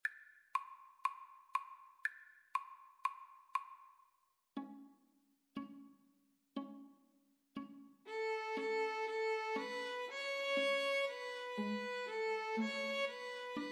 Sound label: String trio